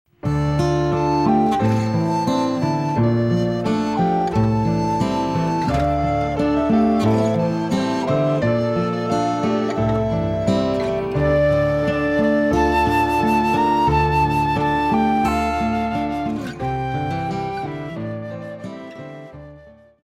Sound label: Instrumental; Many and Great, O God